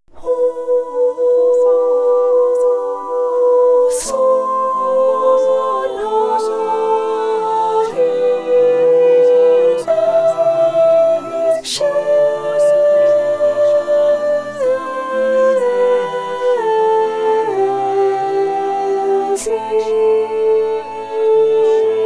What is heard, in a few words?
Soprano